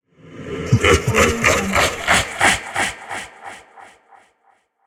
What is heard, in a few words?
halloween ghost laughing